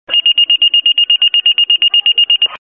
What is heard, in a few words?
pager, beeper